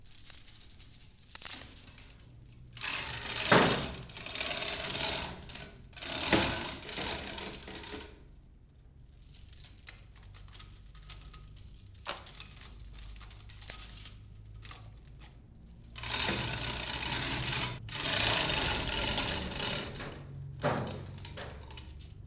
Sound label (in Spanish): Cadena De Persiana De Local Abriendo